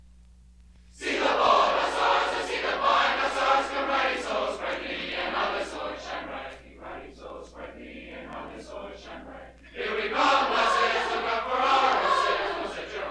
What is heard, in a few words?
Polka